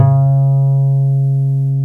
HARP C2

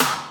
SD, Dance Mega Drums; Best Service

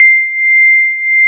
DX PIANO; East West; Twisted Textures-BT

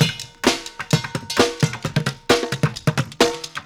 Street Groove, Ambient Grooves

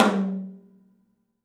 open tom; 3D Sound; 3D Drums

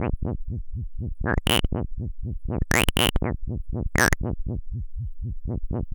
Noise, LFO & Noise

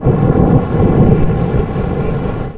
NEW BREATH, ORGANIC; USB Soundscan vol.33; AKAI; Disjointed Textures

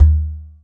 Tumba FX, EMU Orbit-3 drum machine; 300 Drum Machines

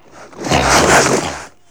HORSE JUMP; ANIMAL FX; Greytsounds VOL-1

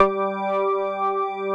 TONE ORGAN; E-MU Formula 4000 Series Vol. 5; Protozoa; Phatt Instrmt

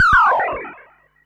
Perc Synth Moog Delay

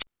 Techno, EMU XL-7

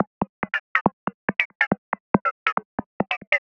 perc 140 square moding, Percussive Loops 140 bpm

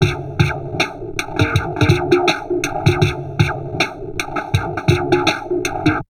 DIST 80, Drum Loops Crazy Processed; USB Soundscan vol.07; AKAI

